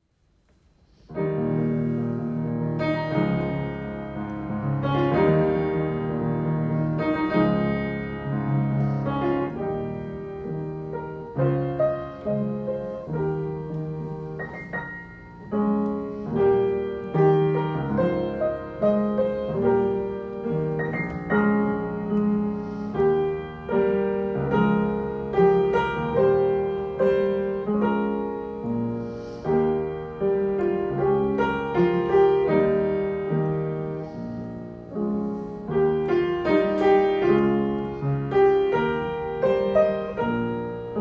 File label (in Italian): solo piano; Puccini Turandot